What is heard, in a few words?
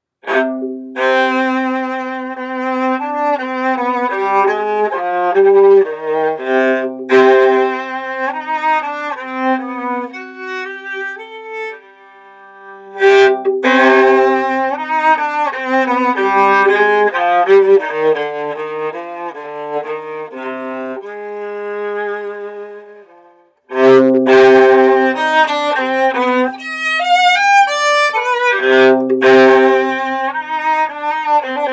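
Andante Appassionato Viola; Sebastian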